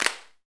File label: PRC XCLAP, Hand Claps